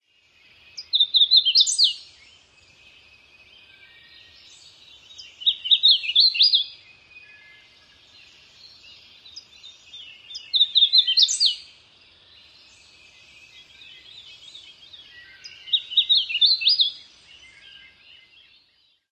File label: dawn song; PA